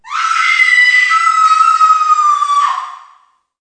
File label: SCREAM F; REDNECK; RAMPAGER